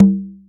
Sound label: Conga Lo Open, drum machines